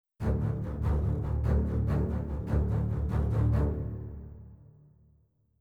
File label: basses; Netease; kill boss tortoise